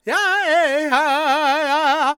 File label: G# MIN, World Voices, LAKOTA; Spectrasonics Vocal Planet CD5